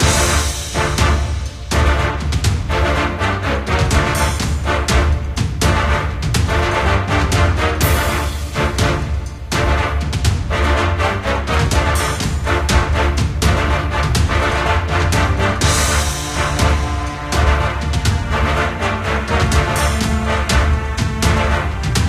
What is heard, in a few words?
Dungeon